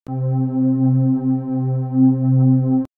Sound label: pad; fancade